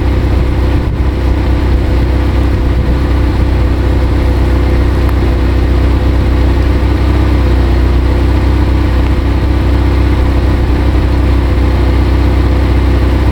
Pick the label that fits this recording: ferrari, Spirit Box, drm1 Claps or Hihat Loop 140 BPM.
ferrari